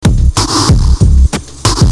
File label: depressing rock music